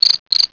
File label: Cricket Chirps